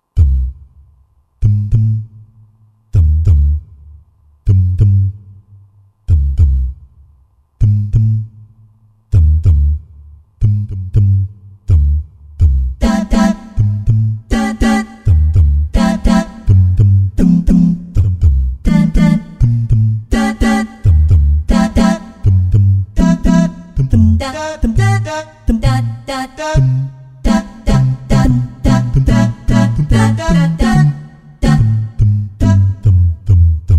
Happy Scats, Vocal Collection; Roland SR-JV80-13 Vocal Collection

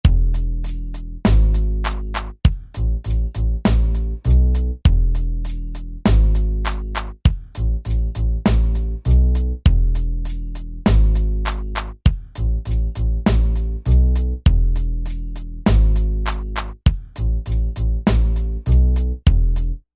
Reggae

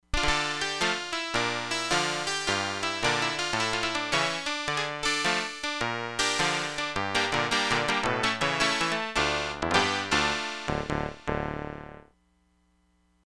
HARPSICHORD, Crumar Bit 99; Factory Programs

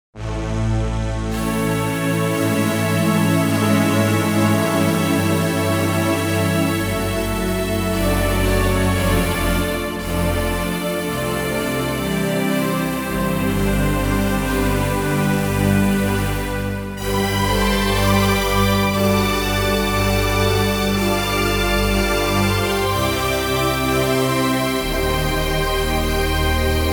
THE STRINGS, Roland JV-880; Factory Patches

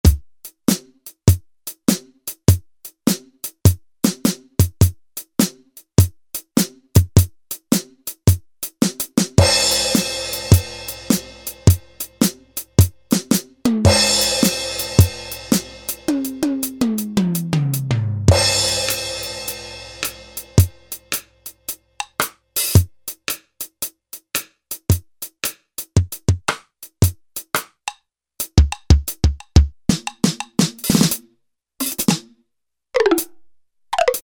Country Kit, Factory ROM kits

